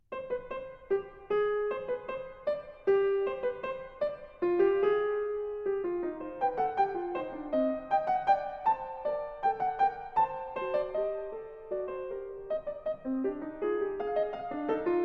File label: Fugue in c-moll; Bach; Fugue No.2; BWV 847